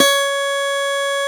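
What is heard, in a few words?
Steel Acoustic Guitar E3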